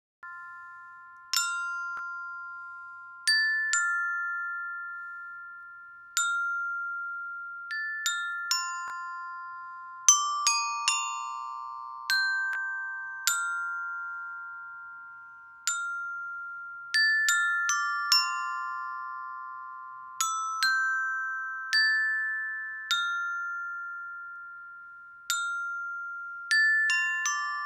glockenspiel adds

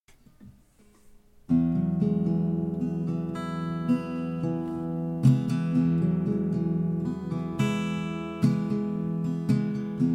E 112 GTR arp open E

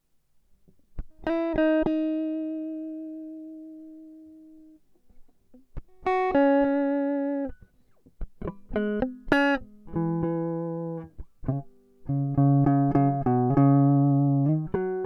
C#m100 acoustic

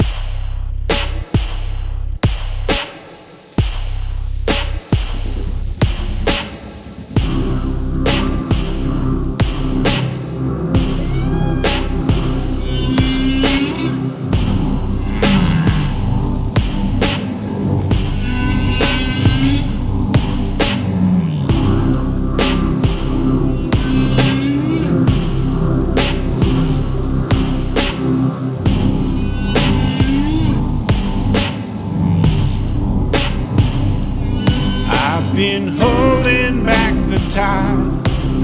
Whale Song

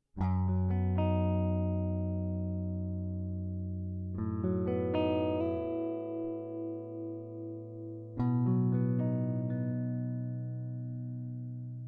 Guitar Motif In B Minor

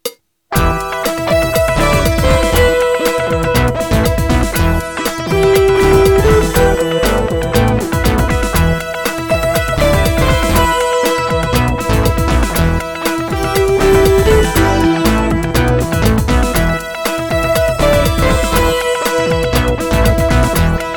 Funky Bliss

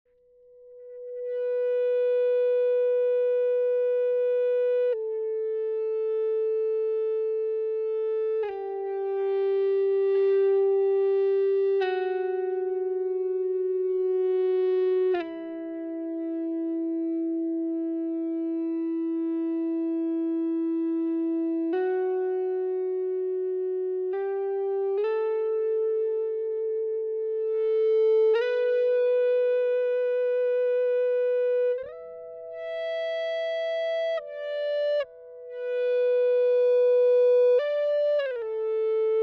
Em70 Ebow dry

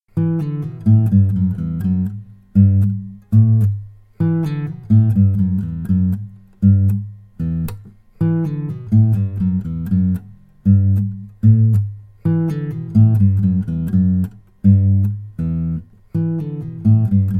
Space Bubble Acoustic

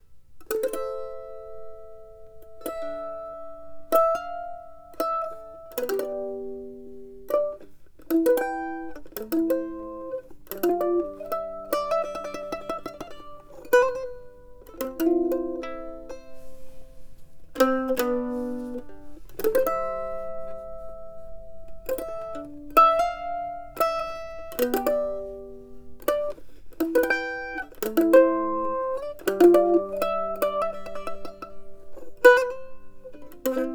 Sarabande Mandolin dry; Reusner

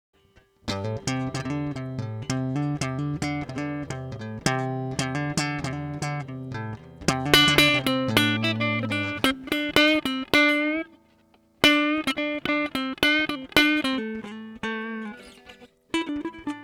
Inequality Groove

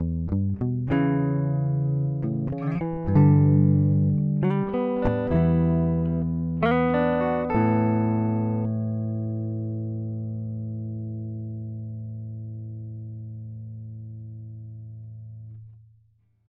A108 GT Riff dry

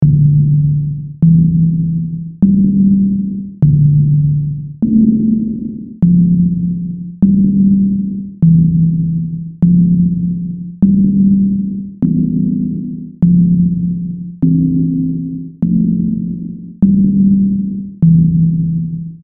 Loops

